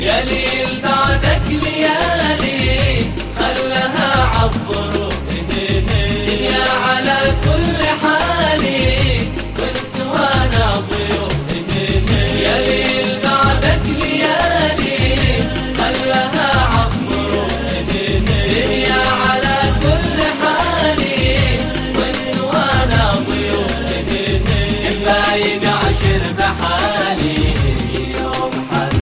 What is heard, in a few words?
arabic music